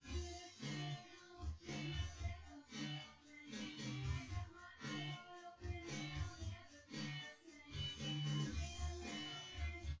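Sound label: with drums, synth conv, 250ms, 5cm; SiSEC10; underdetermined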